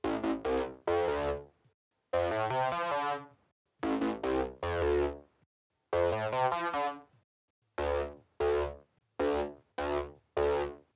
Chorus Synth

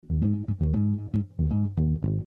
Bass

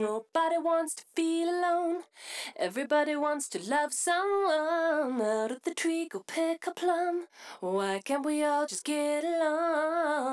Vocal

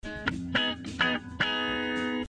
Clean Guitar

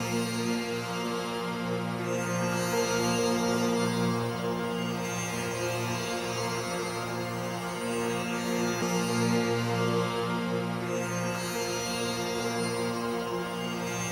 Sitar Ambience

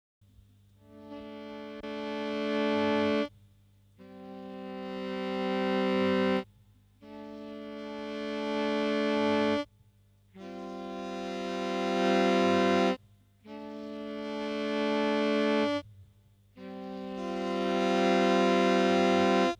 Sax; CMI

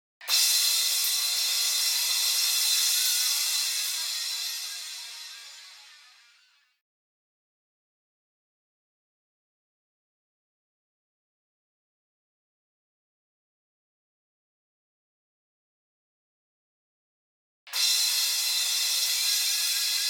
drums

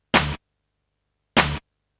Drum Hit; Multitrack

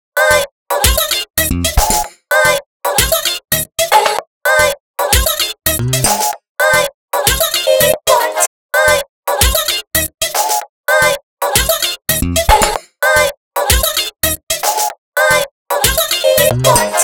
F 112, Discostep Disco Loops; Discotecha2

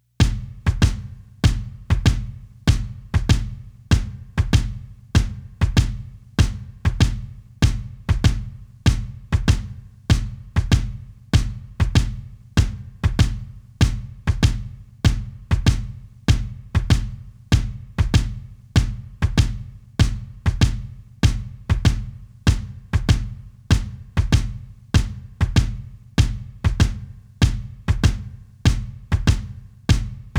drums

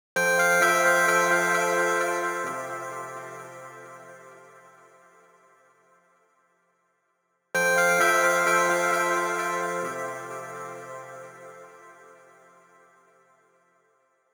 Sparkle Piano

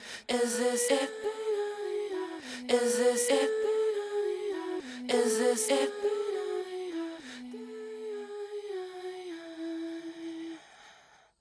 Effected Vocals Bridge; Multitrack

